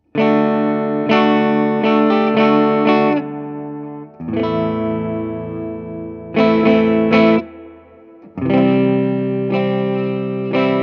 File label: Gibson